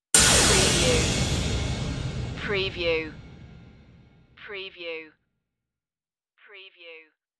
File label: hit; smash tv